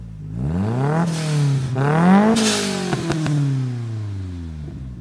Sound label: Xforce Exhaust